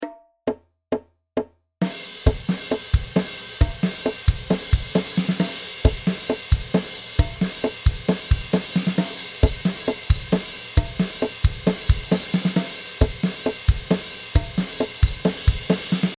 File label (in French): Pont 134, batteur